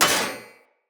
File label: Short Sword Thrown Impact Heavy Armour